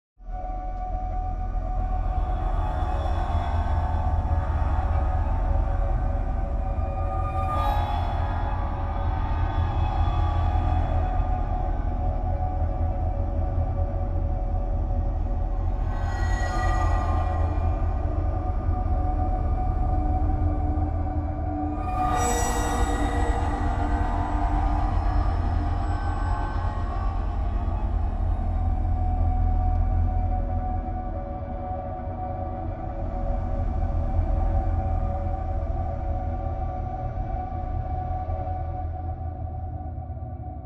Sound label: Cave Atmos